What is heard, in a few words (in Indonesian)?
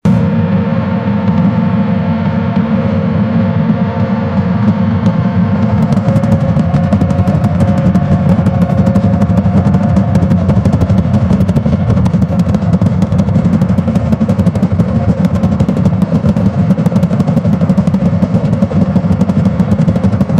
drum militer yang menegan, marching